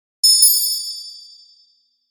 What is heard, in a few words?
Hit Bright Chimes Ring; 5.1 STEREO MIX; Blastwave